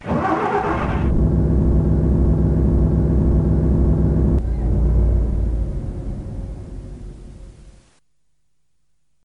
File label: Diesel Idle